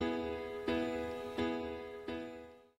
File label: Pianio